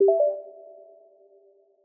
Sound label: Error; Celestial 2.1